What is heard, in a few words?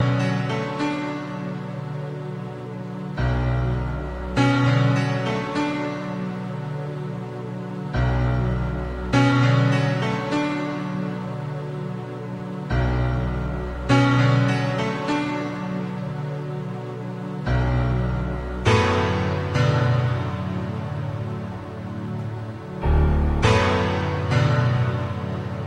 Piano and String, Ambient